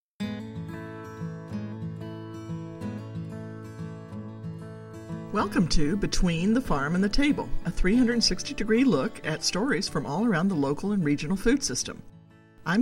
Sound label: intro outro with music